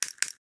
mouse click; midnight guns

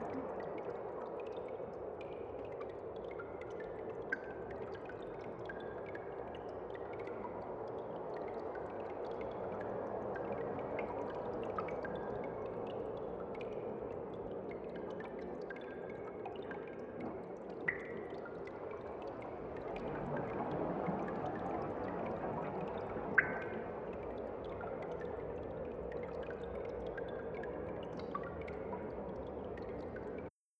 amb cave; midnight guns

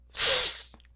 death, snake; midnight guns

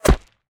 hit confirm; impact; midnight guns

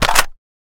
m4a1 out, weapons; midnight guns

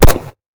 mp5 fire; midnight guns

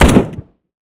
m4a1 fire; midnight guns

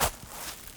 foot grass, footsteps; midnight guns

